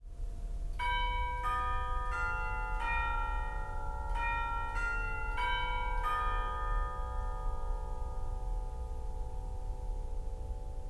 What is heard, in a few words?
chimes